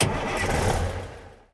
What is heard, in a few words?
vehicle semitruck ignition; teardown; ps5 windows xbox series xs